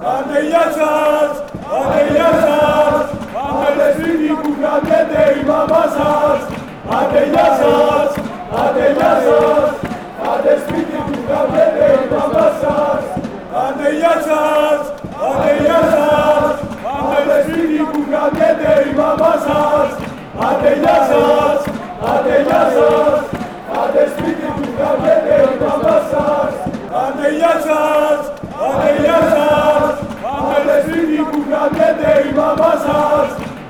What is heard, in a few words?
aek athens chant